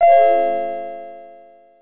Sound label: notification mobile